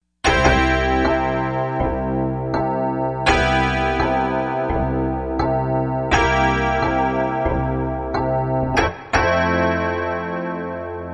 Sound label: Hard EP; Factory Presets